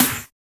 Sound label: DB SNARE, TECHNO; Drums In Your FACE!